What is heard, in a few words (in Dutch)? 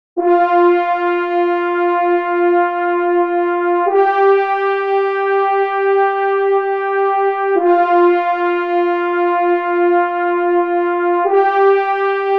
Hoorn in Eb